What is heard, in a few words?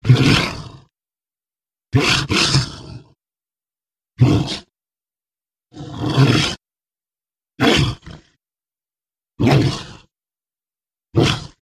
Monster Type 1 Snarl